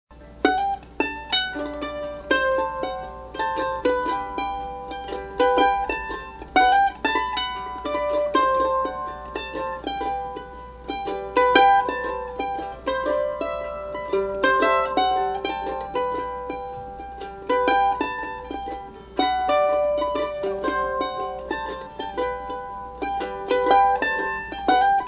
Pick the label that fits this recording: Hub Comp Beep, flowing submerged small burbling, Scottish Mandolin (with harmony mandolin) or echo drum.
Scottish Mandolin (with harmony mandolin)